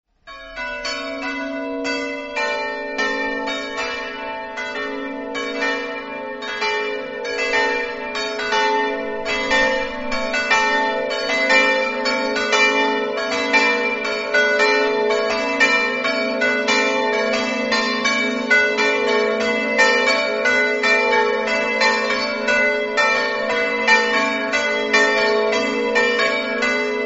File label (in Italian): campane; villanova